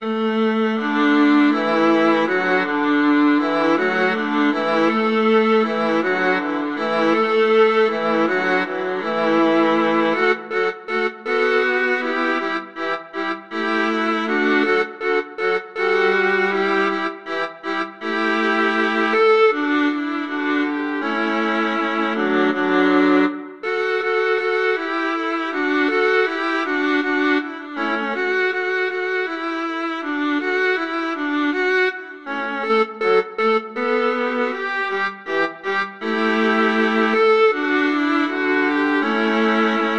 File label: viola trio; handel water music